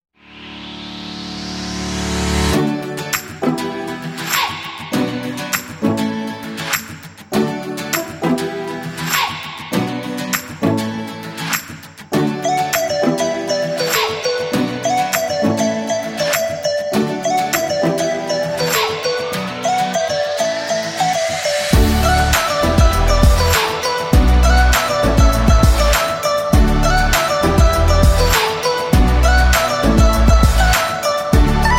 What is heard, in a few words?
Energetic Upbeat Pop